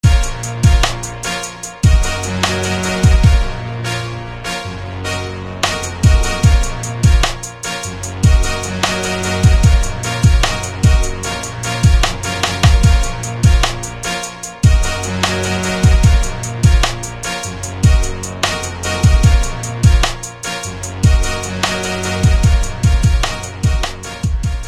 Four Bands of Brass

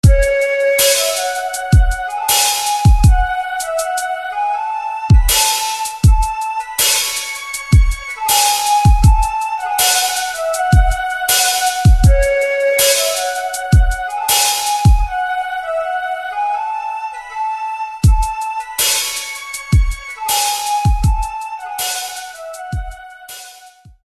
Flute; Windshallow; SFB FX

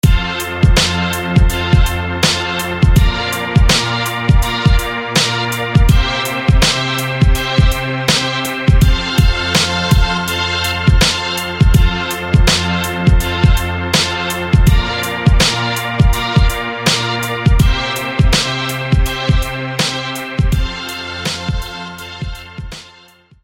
Nice Strings; SFB FX

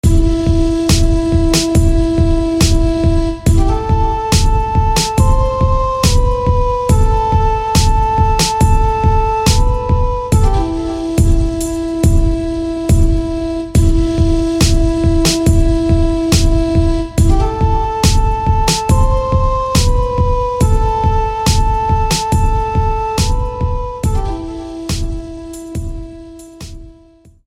Eagle Flute

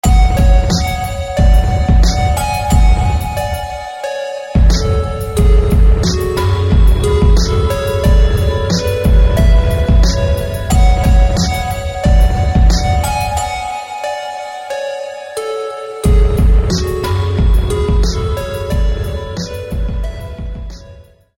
Haunted Metal; SFB FX

